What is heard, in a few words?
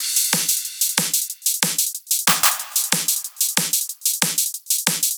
Hats and Claps